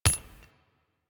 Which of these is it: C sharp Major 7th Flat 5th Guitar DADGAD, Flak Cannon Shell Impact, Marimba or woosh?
Flak Cannon Shell Impact